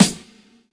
Reverb Snare Single Hit G Key, steel snare drum sound tuned to the G note